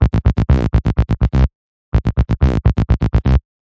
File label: tech bassline